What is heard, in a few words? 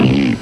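raspberry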